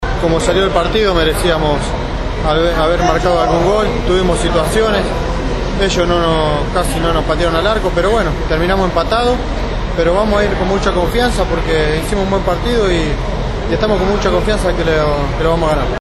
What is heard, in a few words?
ferrari